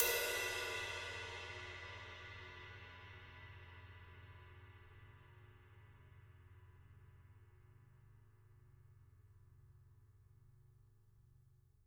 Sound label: rides